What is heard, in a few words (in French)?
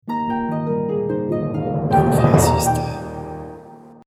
Jingle court Harpe voix; Novia Systems